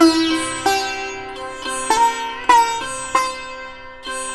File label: SITAR, Indian Traditions